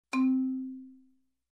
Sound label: Marimba C Note